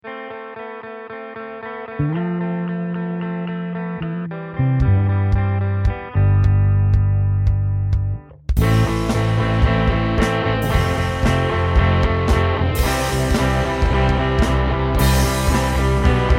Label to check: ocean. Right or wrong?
Wrong